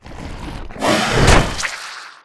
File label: bite; Apotheorasis